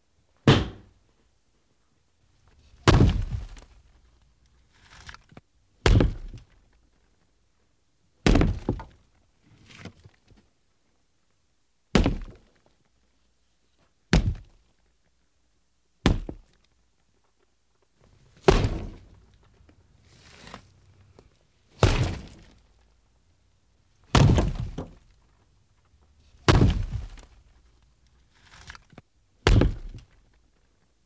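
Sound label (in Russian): knocking plaster off the walls; Звуки строительных работ обычно связаны с разрушениями, такими как снос зданий, разравнива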